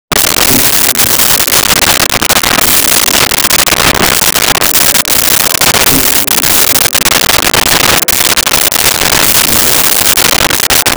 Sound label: Human Swallow With Reverb; Vintage Cartoon; Comedy Vocals; SONY PICTURES SOUND EFFECTS SERIES